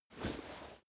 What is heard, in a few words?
leather metal movement soft slow single